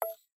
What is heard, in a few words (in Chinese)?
记分板展开; 战中; nba